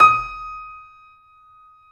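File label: KEY E flat 5, YC7 Piano mf; Roland L-CD701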